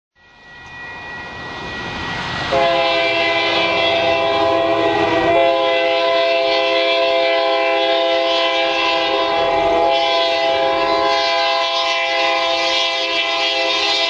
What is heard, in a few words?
horn whistle